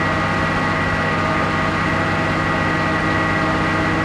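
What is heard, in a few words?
chieftain engine interior rpm 33, tanks; War Thunder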